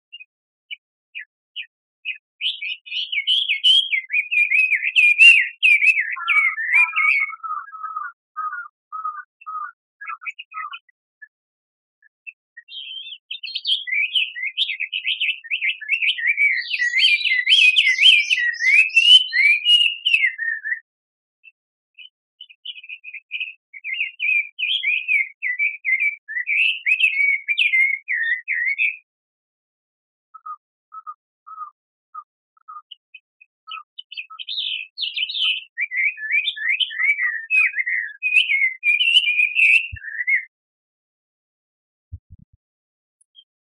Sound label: Bird